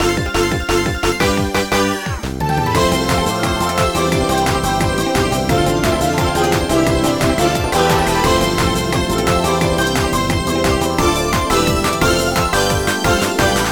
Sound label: Bgm bonus time night common faster; cookie run